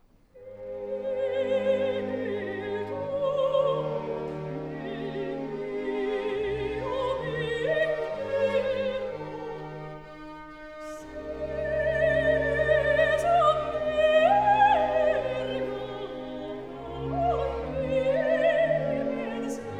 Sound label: soprano